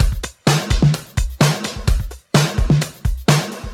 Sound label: House Beat, 128 BPM